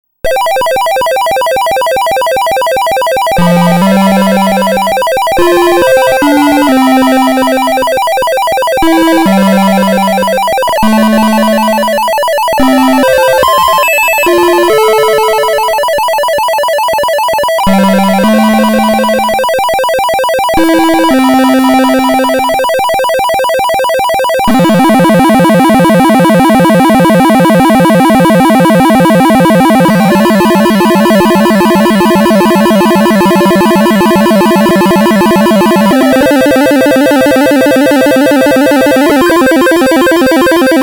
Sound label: SYNTHCART SQUARE ARP; PAUL SLOCUM